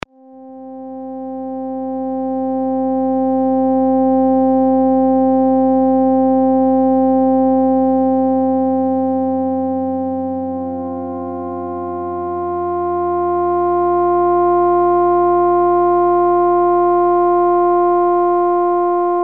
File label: Darkness Just Flute N background Noise; Chuck's Zombie Petting Zoo; Marr0w Productions